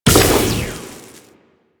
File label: Tentacle Proj Die; Warframe; Proto Infested Ancient; Abilities